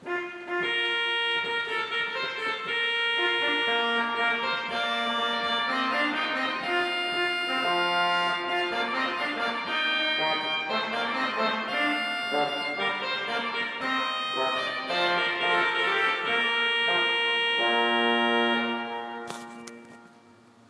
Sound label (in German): trompete, orgel